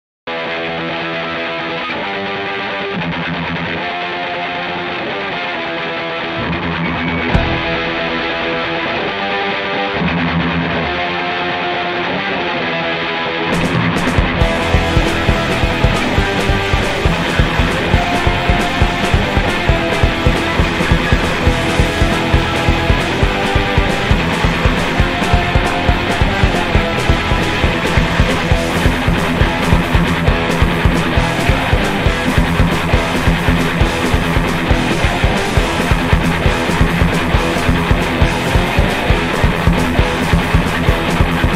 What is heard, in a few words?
punk